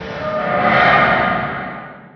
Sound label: warehouse; SpecOps2 MPlayer Demo